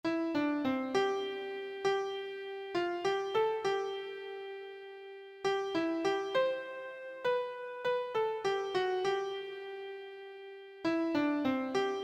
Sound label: blessed assurance, keyboard